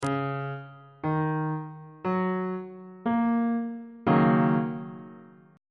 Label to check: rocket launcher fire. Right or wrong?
Wrong